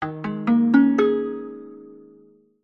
multimedia game sound plucked accoustic and mallet generic tone